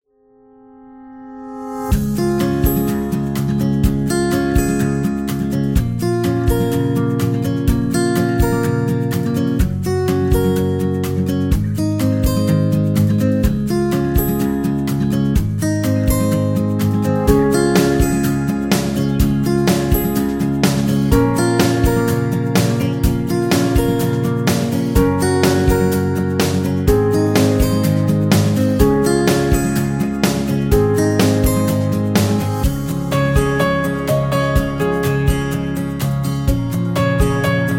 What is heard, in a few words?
Acoustic Guitar and Romantic Piano; happy music vol 6